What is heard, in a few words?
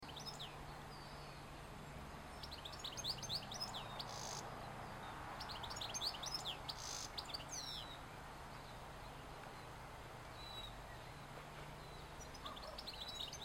Canary Voice